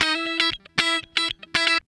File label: Guitar Licks